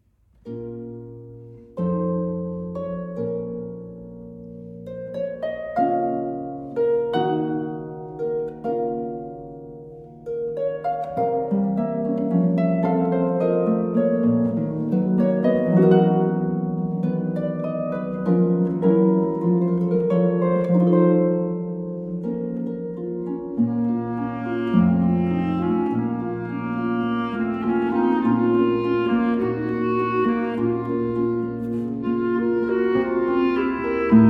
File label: Arpeggione; Schubert